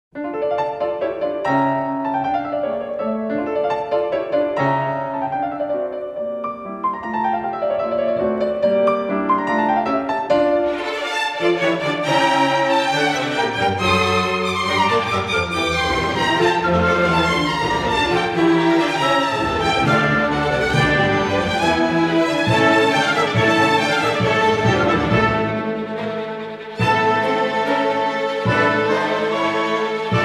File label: Piano Concerto No.20 in D minor; Wolfgang Amadeus Mozart; Piano Concertos Nos 20 & 24